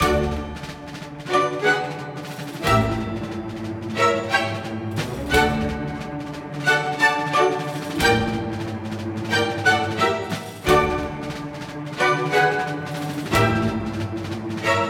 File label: Orchestral Chaos; rayman legends the complete soundtrack gamerip 2013